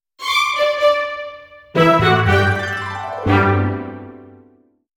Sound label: jingle